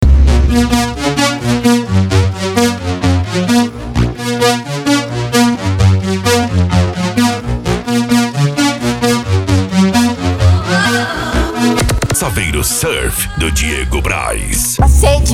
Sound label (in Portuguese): funk consciente e funk ritmado